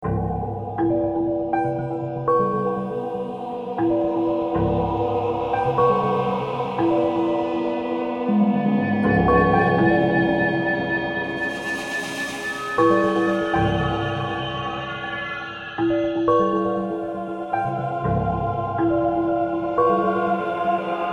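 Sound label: Rescue Com Ambient Loop; F.E.A.R.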